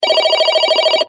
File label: notification sound